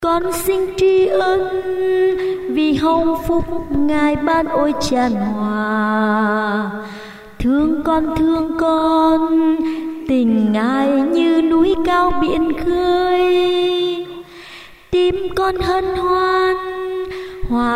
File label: sop alto